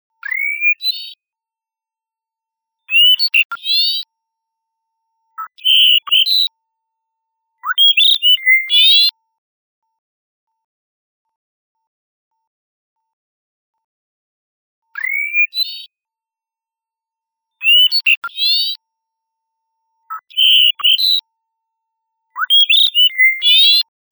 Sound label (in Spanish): canto, especies amenazadas; conservacion de especies amenazadas; biodiversidad